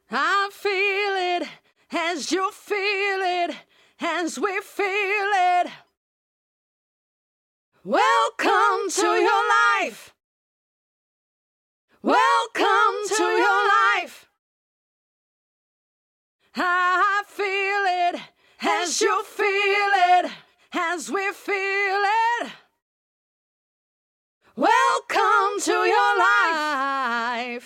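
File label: house dance 115 G major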